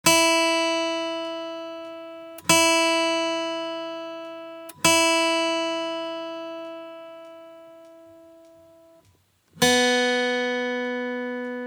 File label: tuning